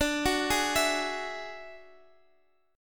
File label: F sharp 7th Suspended 2nd Sharp 5th, D